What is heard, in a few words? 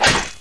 CLUB; Caesar III